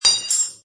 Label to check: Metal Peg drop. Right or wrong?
Right